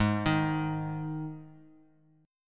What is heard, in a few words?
G sharp 5th G sharp; arpeggiated; JGuitar